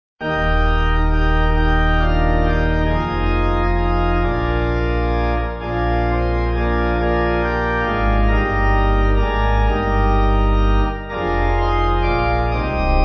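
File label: Pipe, Pipe Organ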